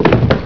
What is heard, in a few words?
DROP OBJ; IMPACTS